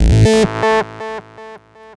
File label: Synth Ultra Bass